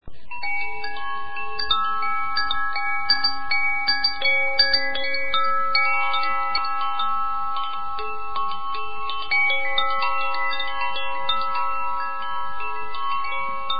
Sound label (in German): shanti, Klangspiele